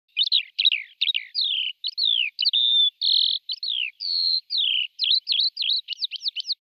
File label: Eurasian skylark chirping and singing